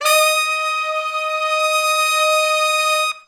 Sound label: Hi Long Note (Eb), horn section; Sections